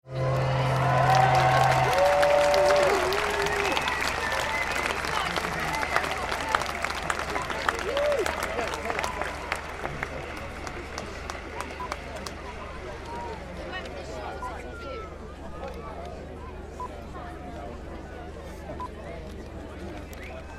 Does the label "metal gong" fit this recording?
No